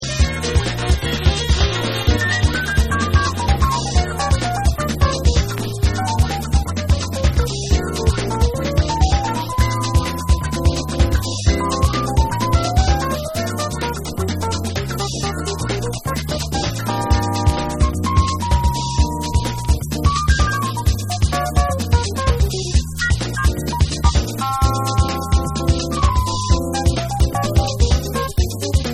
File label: Lounge Instrumental